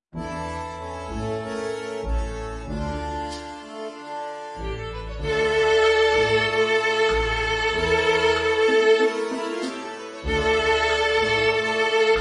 Slow tango

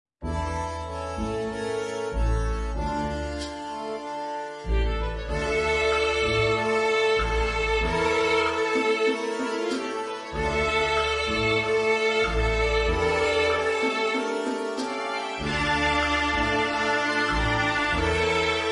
Slow tango; Hey Presto for viola bk 1 bronze

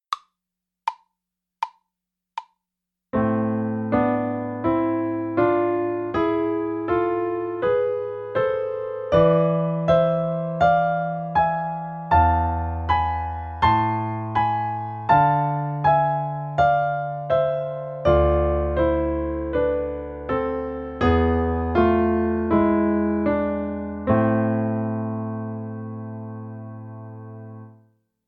A minor scale accompaniment, violin

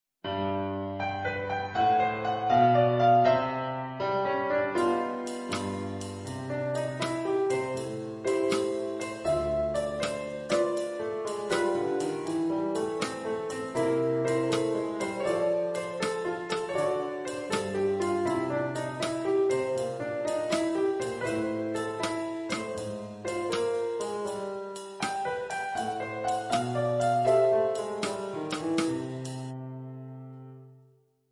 accomp, viola; hey presto for viola bk 1 bronze

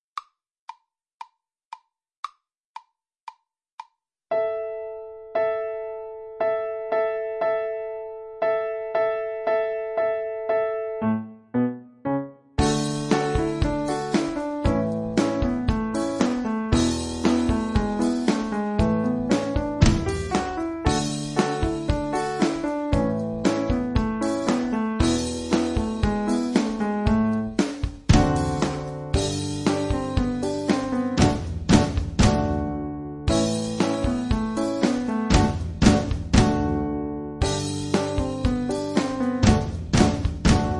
Hoe down accompaniment

